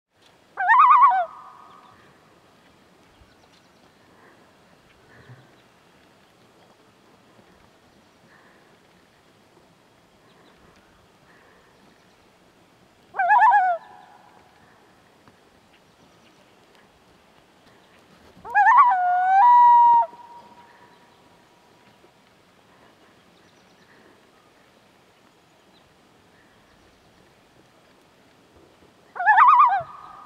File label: Common Loon tremolo calls